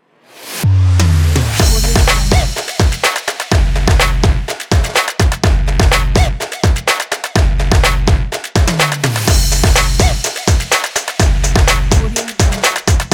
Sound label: drums